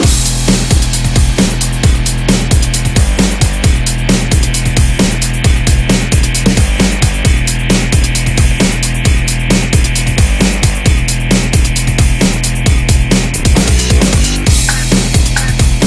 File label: dead trance; spawngirlsounds